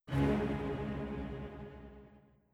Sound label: basses trem; Netease